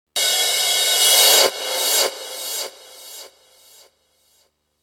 Reverse Cymbal, Dramatic reverse cymbal stinger with a delayed fade.